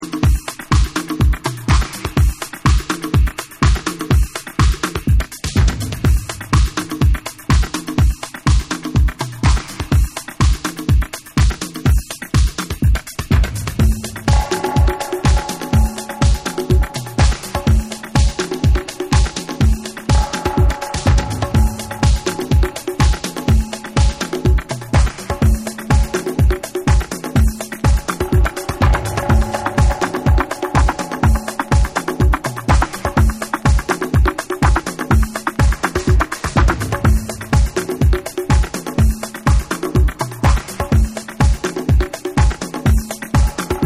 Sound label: Unreleased Drum